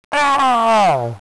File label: xmas tree hurt